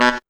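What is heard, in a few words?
sax tone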